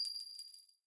ping; Personal Adjustable Waypoint; catspaw

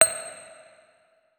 notes, guitar; Hideout Furniture

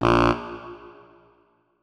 notes, saxophone; Hideout Furniture

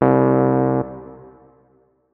notes, keyboard, electric piano

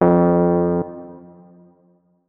notes, keyboard, electric piano

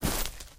default, human step; Footsies

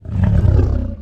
growl, Angry Chimera Growls; TBs Angry Chimera Growls

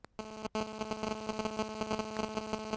cell noise; Devices of Anomaly Redone; catspaw

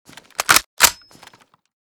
val unjam, weapons; Mask's Reanimated Anomaly Arsenal

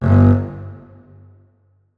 notes, keyboard; Hideout Furniture

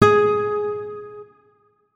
notes, guitar; Hideout Furniture

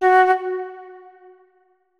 flute notes; Hideout Furniture

